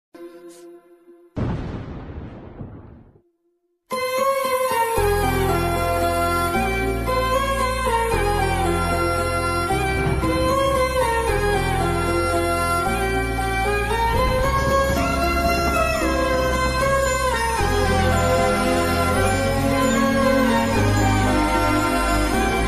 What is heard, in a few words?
movie bgm ringtone, new tamil movie bgm; my dear bootham